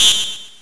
BADBONUS

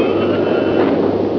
gear

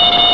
STALL; VERTIGO

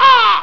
BIRD; GONDWANA

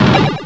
shield

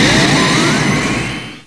torpedo

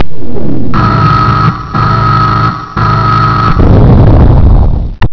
DOOR OPEN

